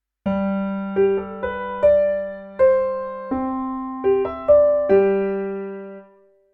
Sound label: song with I IV with sd above